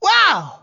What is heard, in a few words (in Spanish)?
alerta wow